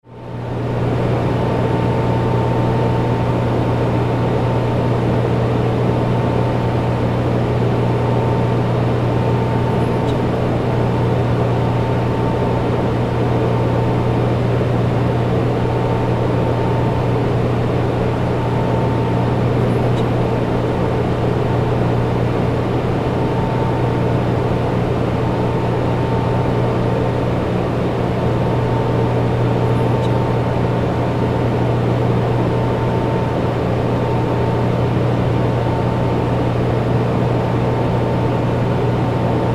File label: computer data center room ambience